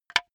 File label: wood board hit loose; objekte; holz